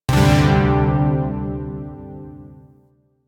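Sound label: Shawzin Pentatonic Major Chord; Zariman Shawzin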